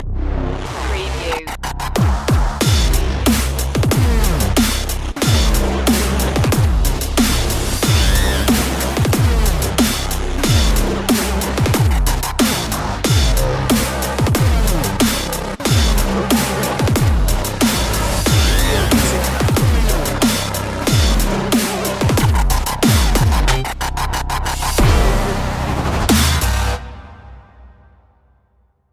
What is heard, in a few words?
dubstep of frying cpu